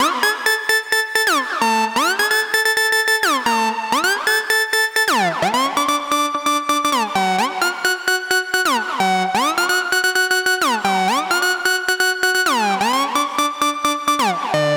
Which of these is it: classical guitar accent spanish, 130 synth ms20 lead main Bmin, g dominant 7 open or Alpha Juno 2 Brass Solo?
130 synth ms20 lead main Bmin